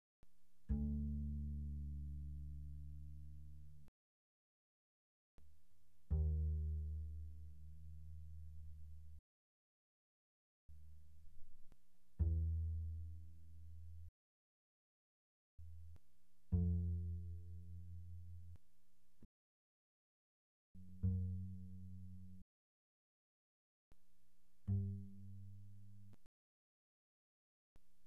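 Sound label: Bass pizzicato pianissimo sul D D2 B2, doublebass; Strings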